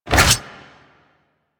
Melee Block Start; Warframe; Wraith

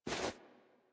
Movement Foley; Warframe; Wisp

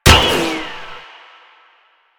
Shield Impact; Warframe; Lasrian; Lasrian Tank

